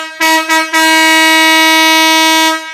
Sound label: compressor